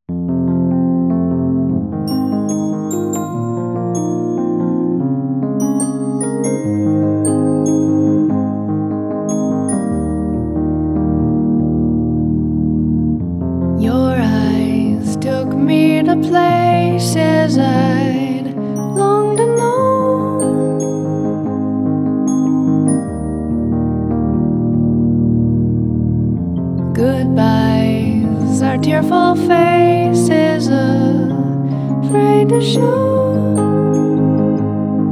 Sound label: hammer and chimes; whirlwind girl